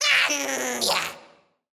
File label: Necromancer Victory Vo; Android; Portal Quest